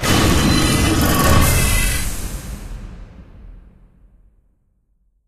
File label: pincer wall reveal down; Source Engine; Portal 2